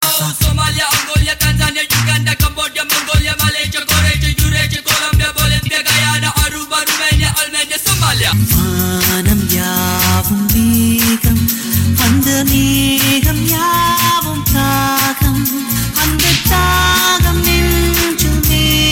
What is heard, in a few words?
theme song